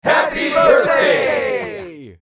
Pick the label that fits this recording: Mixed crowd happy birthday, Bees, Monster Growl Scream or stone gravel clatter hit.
Mixed crowd happy birthday